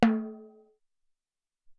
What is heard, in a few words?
Timbal